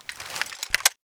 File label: sight, rpg26; tacz; RFP v1.0.0 beta2